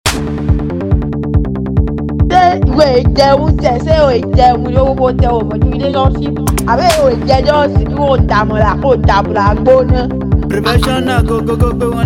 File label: Beat